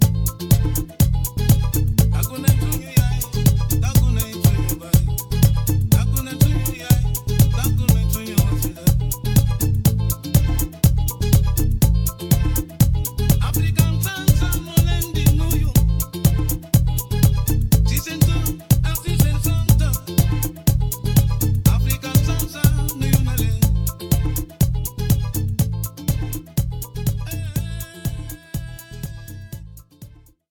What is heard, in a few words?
African Salsa